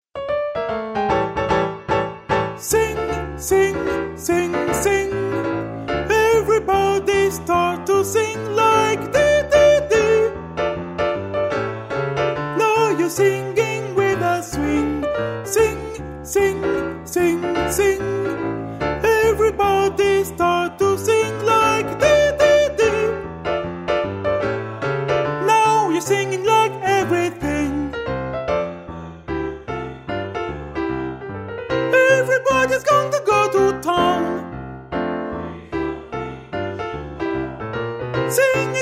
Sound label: SOPRANO; LENT